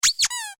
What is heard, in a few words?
Toy Squeaky Squeak Short; Miscellaneous Toy; Strange Toys